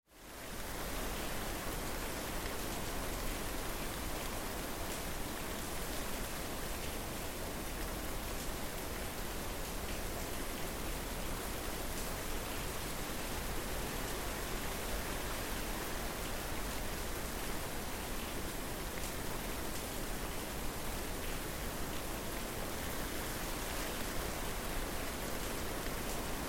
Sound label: Rain drops; MSB Team